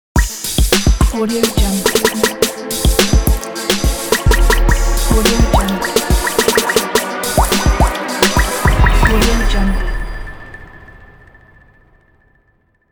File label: digital formations logo